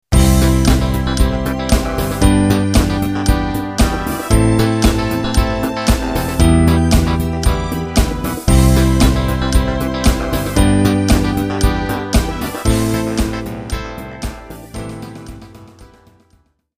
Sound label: bass